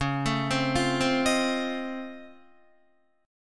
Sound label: Db Augmented Major 9th Db down Guitar; arpeggiated; 4E,4A,5D,5G,5C,6F